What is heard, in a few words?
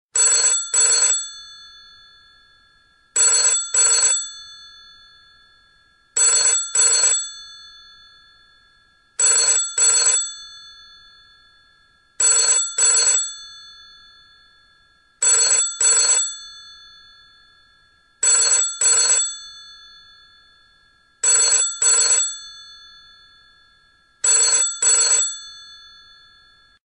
Phone Antique